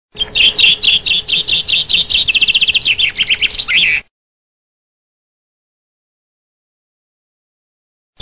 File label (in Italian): FRINGUELLO, birdsound